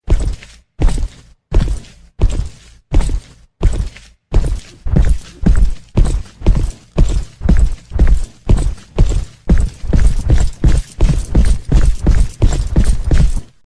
heavy footsteps

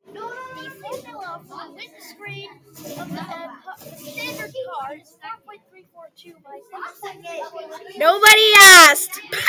nerd kid lololol

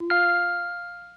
right answer

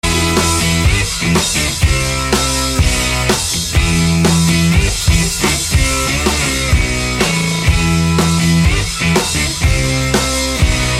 heavymetaal

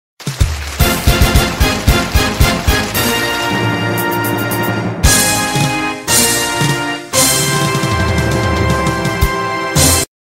videoke score ringtone

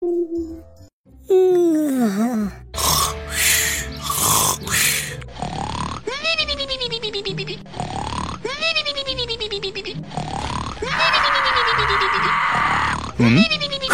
sleeping sound baby; sonic

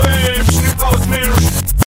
glockenspiel

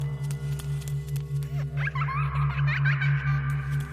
creepy child laughing giggling

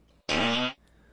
fart

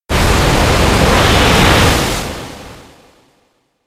waterfall

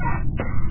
vent

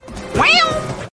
meow; adrien agreste

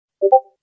notification sound; discord

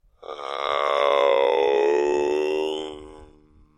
ommmm

